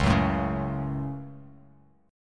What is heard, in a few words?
C sharp Suspended 4th Sharp 5th C sharp down, chord; strummed; JGuitar